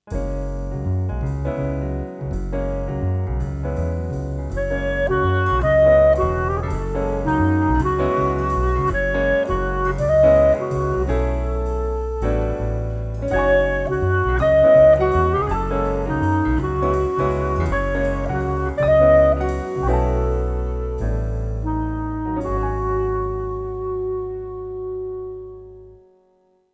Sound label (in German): thema jazz; pastewka